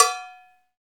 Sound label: PRC cowbell, Roland; Club-50 - Foundations Roland; CowBel+Metl